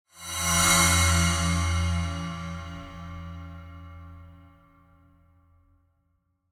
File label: Cymbal Swell Eerie; noise creations